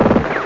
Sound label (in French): mitraill